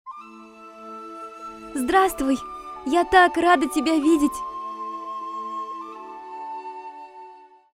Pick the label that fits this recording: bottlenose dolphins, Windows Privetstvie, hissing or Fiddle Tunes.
Windows Privetstvie